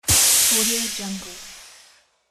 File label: steam